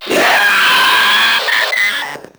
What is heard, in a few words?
Proto Infested Runner Vocal Attack; Warframe